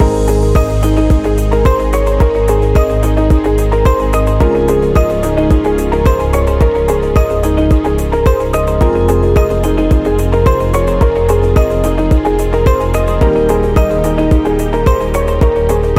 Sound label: Inspiring Corporate Loop; explainer